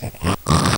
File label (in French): raclement bête